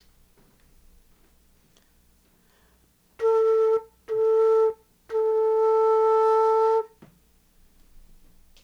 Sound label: baroque flute low; mid-ranged tone being played on a baroque flute